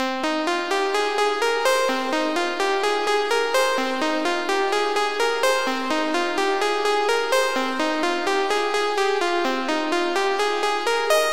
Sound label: synth with ctx